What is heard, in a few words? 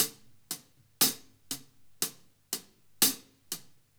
Laid Back New York Loop, Drum; Sonic Foundry (Sony Creative Software); Loops & Samples Drum Tools